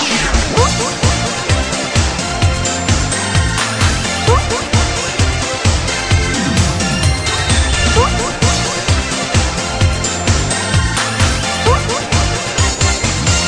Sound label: cute message tones; ringtones; sms